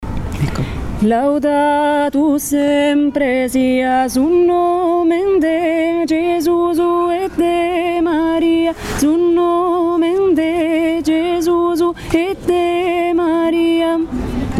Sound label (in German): laudatu obere stimme